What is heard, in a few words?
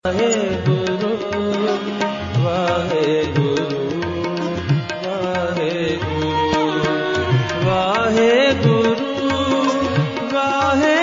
GURBANI RINGTONE